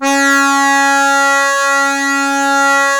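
DIATO C#2, diatonic accordion, Historical Instruments; AKAI; USB Soundscan vol.23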